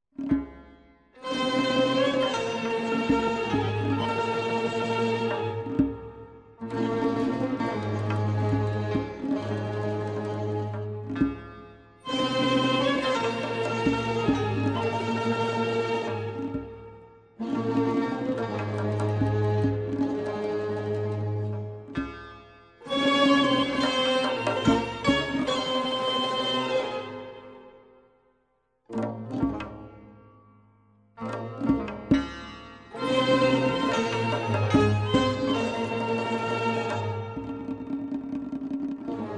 Dargham Segah

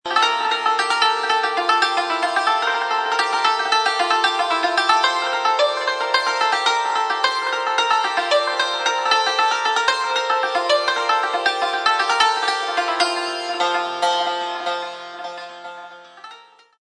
Chand Mezrab Panjgah Rast Panjgah

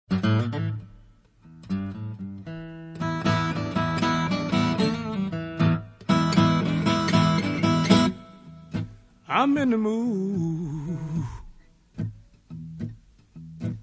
12 string sample

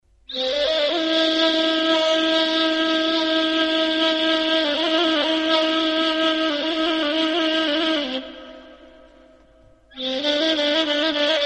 Ney chupani Musighi Maghami Bakhtiyari

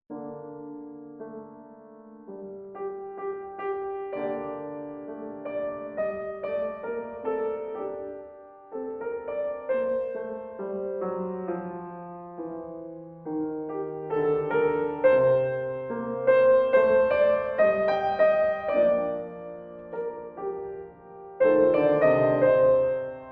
solo piano

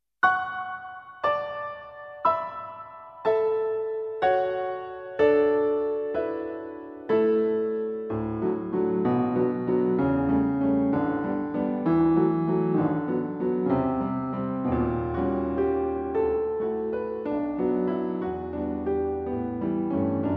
Waltz

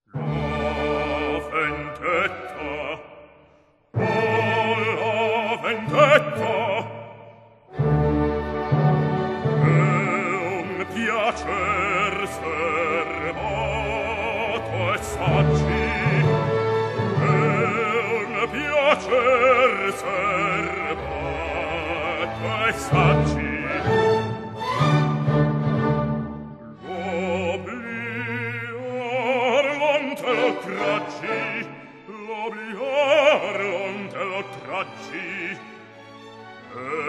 bariton bass

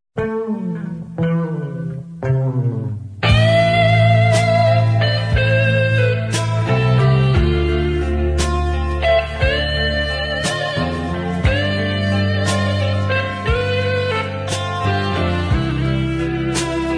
melody

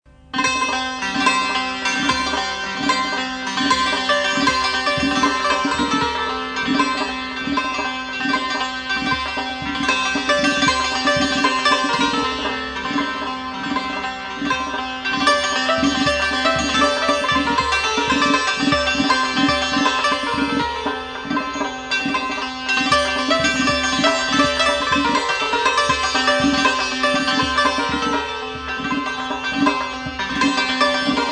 Chaharmezrab Bayattork

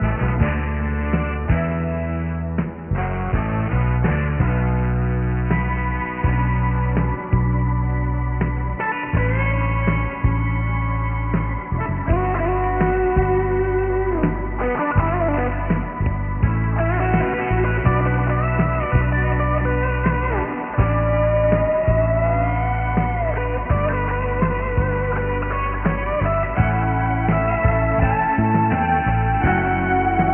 gibson les paul

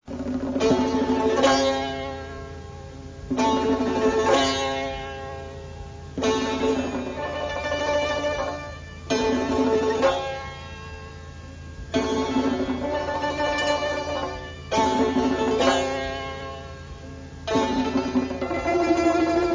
Pish Daramad Naghmeh Chahargah

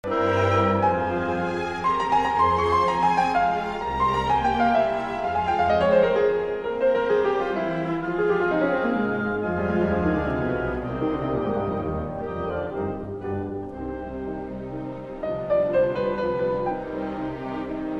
piano concert c moll; mozart

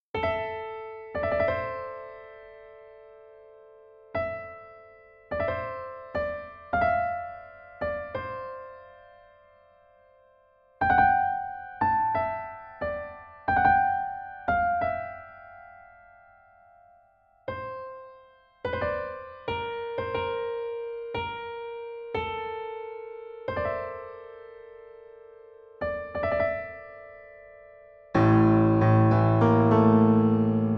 Phrygian Shur

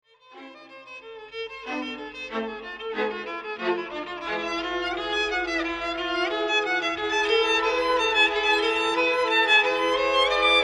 violin

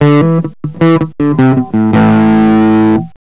a guitar riff

